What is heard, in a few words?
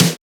Snare; FLPRO